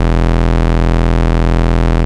Bass E0; Ableton Foundation FreePacks; Foundation Glitch Toys & LoFi

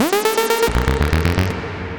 Boinky Saw Riser; Effects

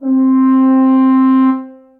French Horn Solo Legato; Ableton; Orchestral Brass

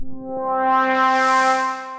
Warped Pad; Synth Pad; Warped Hero Pad; Ableton; Factory Packs; Synth Essentials

